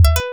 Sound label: result failure